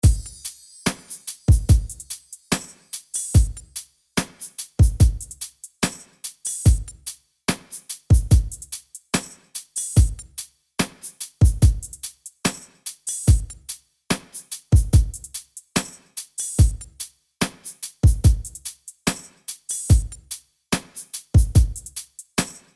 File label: Drums Plate